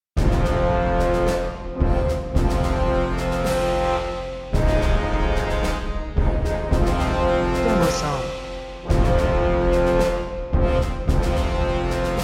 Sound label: Rejoice in Triumph